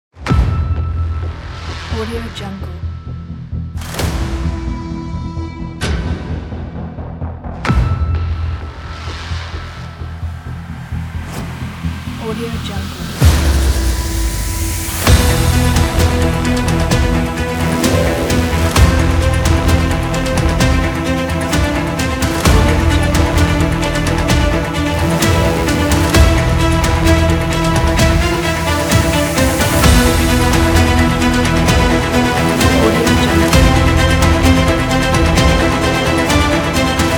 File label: epic action hybrid trailer